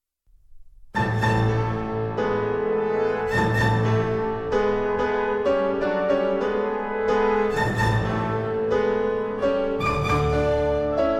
Piano Concerto, Allegro

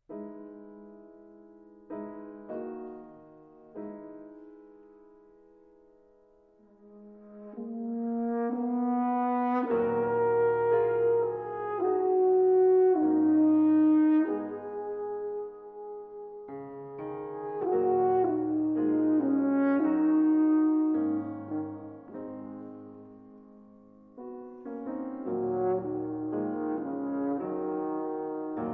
Sonata for Horn and Piano, Adagio

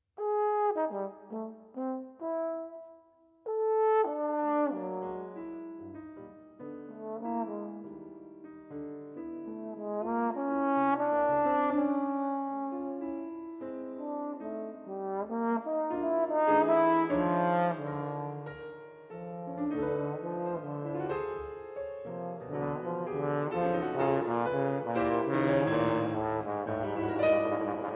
Four Pieces For Clarinet & Piano - I. Massig

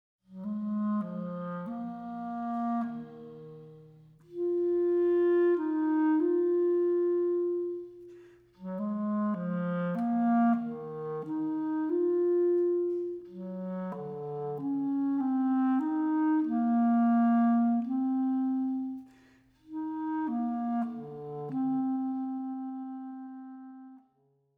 Solo Clarinet, quarter note 52

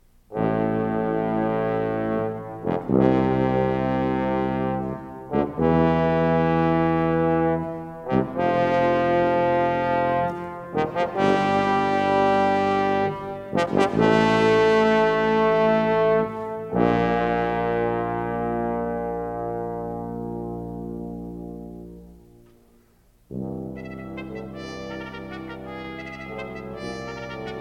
Sonatine for brass quintet

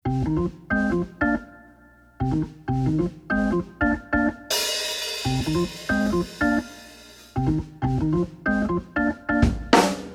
Funk

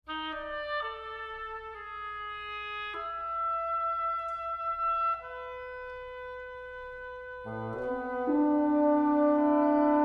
Wind Set For Woodwind Quintet, Eigth=72; Walker (George)

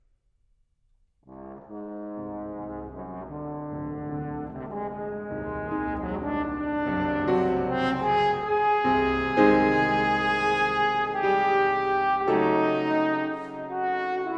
Sonata For Cello & Piano, Allegro ma non troppo; American Voices

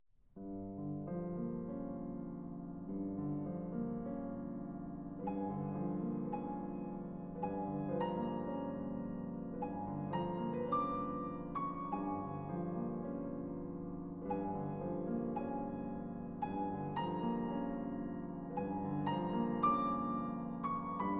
Piano Sonata Andante; 20th Century American Piano Music

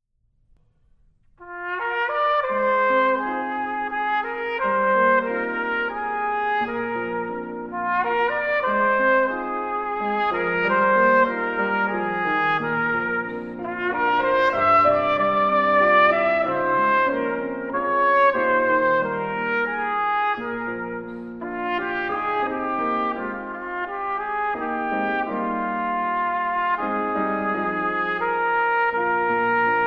Trumpet Songs

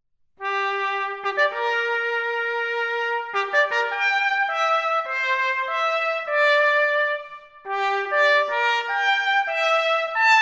Fanfare

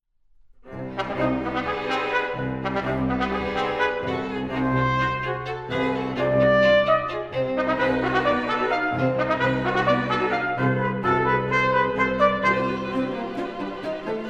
Quintet for Trumpet and Strings, Allegro agitato

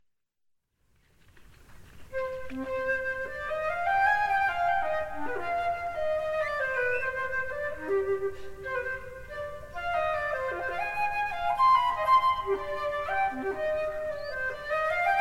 Suite in C Minor, Courante; Suite No. 5; BWV 1011

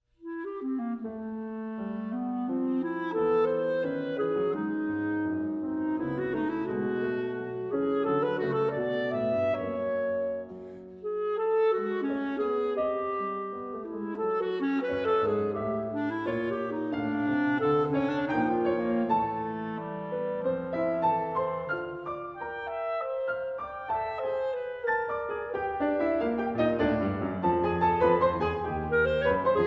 Sonata for Clarinet and Piano, Grazioso

